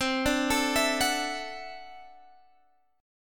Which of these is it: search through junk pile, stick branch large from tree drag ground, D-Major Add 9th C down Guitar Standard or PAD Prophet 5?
D-Major Add 9th C down Guitar Standard